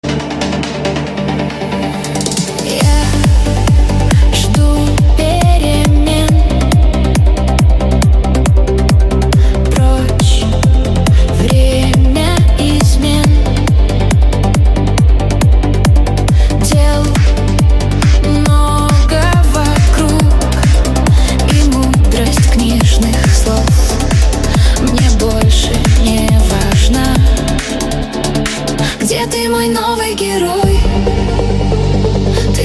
vocal trance remix

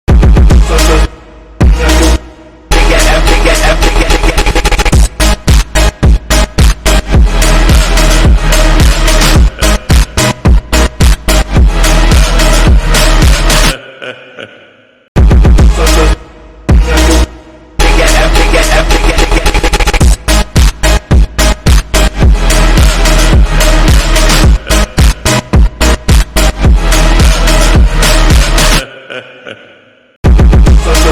phonk

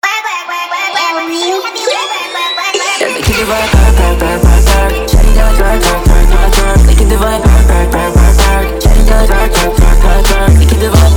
perc twerk remix

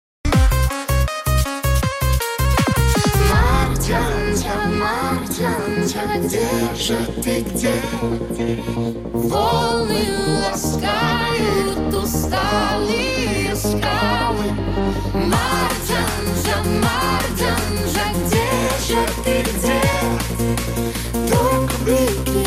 techno remix

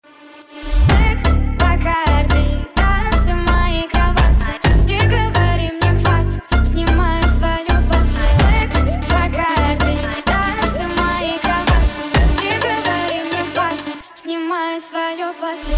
phonk

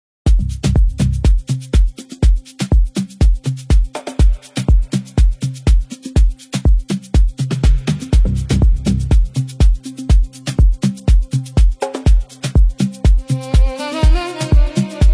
arabic song; dubai sharm el shih doha qatar

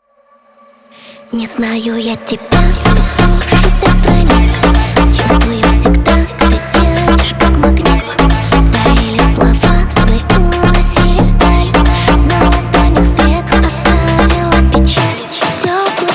phonk

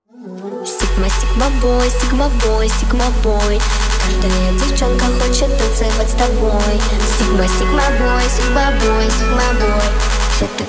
brazilian phonk remix

